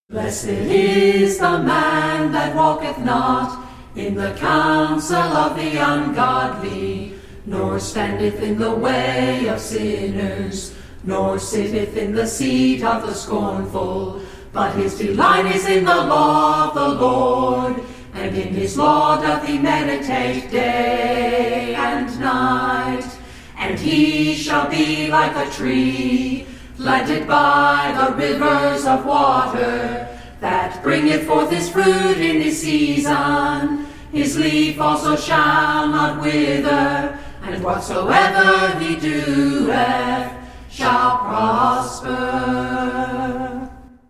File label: a capella scripture song